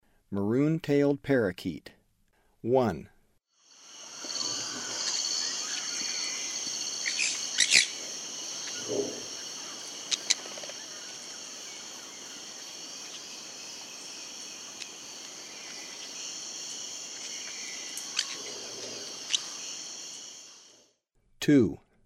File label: Maroon-tailed Parakeet; S. America; Eastern Lowlands